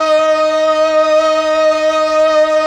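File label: strings D, BRASS+STRING; Best Service ProSamples vol.55 - Retro Sampler; AKAI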